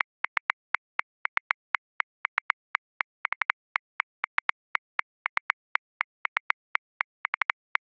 Charanga Shaker 16ths 106 bpm; Grooves; Ableton Live 12 Lite; Core Library

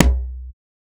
Tom Low; One Shots; Drums

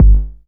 Kick Rounded Impact

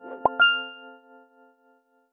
Notification

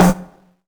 Tom, Drums; One Shots

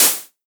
Hihat Closed Noise Build

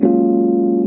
E-Piano GbMaj7; Piano & Keys

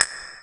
Wood Clack, One Shots Drums

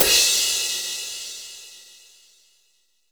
Crash, Cymbal; One Shots; Drums; Mansion; Ableton Live 12 Lite; Core Library

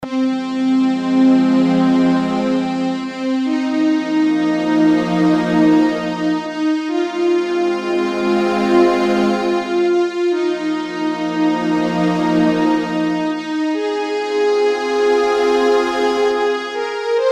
epic strings

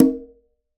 Conga Open Hard; One Shots; Drums; Ableton Live 12 Lite; Core Library

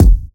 Kick Solid Metal

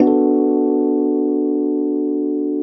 E-Piano Bmin7; Piano & Keys

